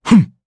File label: Vox Landing, jp; Bernheim; kingsraid